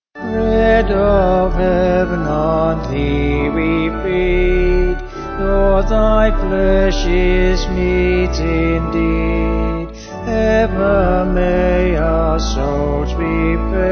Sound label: Bread Of Heaven, Vocals Organ; Sung Lyrics; Maclagan